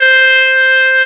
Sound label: Clarinet C5; Star Trek JR Audio